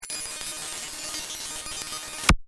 Radio Static Glitch, Scifi, Radio, Static, Glitch, Transmission, Cut, Off; Science, Fiction; Communication Sound Effects